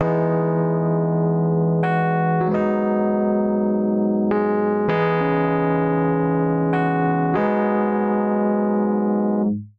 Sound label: Electric Tubes Chords Ab Major 90 bpm; MIDI Clips; Accompaniment; Ableton; Chop and Swing